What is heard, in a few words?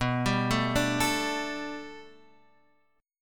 B Minor 7th Sharp 5th B, chord